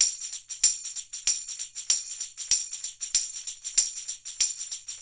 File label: Percussionworks; Sampleheads; NYC Percussionworks; 90 s Sample CDs; LOOPS 96